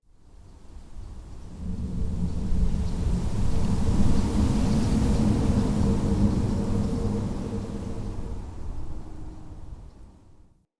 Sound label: Cave Ocean Wind Gust